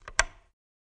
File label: Light Switch Lamp